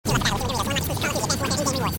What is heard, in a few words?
fuel up